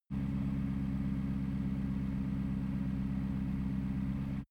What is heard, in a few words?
car aston martin idle